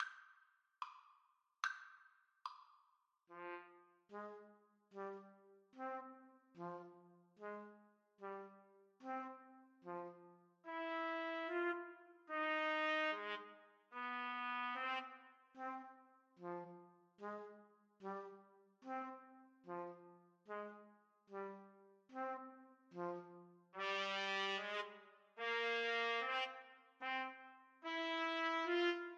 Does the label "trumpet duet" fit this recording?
Yes